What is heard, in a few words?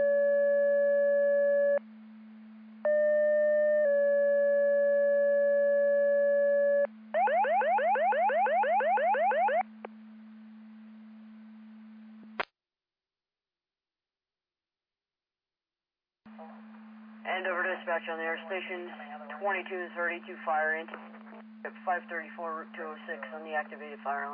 Siren; Andover Twp Boro